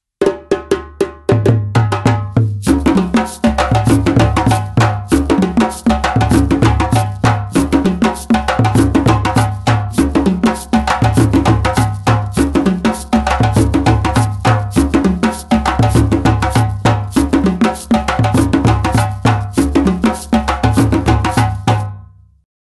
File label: whole rhythm ballet dununs; denadon; rhythms